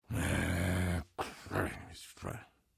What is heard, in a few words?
negative vocalization; engineer